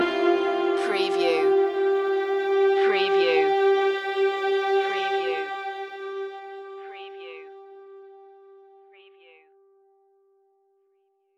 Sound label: loop end